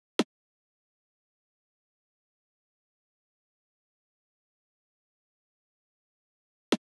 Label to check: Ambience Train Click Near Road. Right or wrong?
Wrong